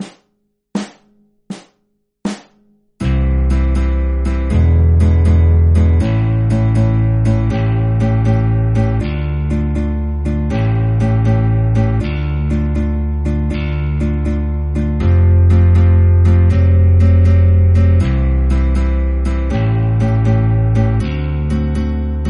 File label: chord progressions 8-bar blues in C